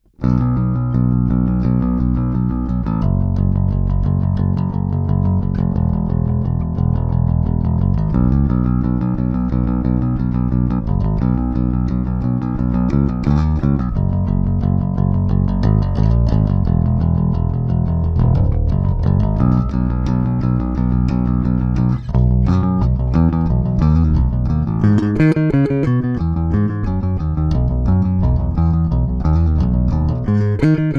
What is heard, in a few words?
Punk Mix